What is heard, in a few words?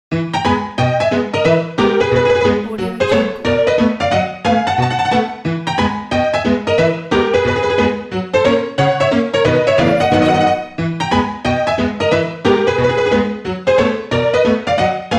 western saloon music ident